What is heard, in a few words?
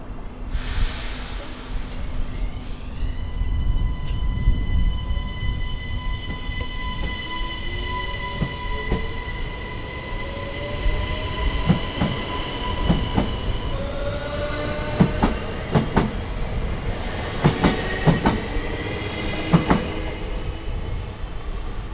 Belgian New L Train Start